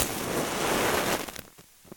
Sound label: ignite; ZBomb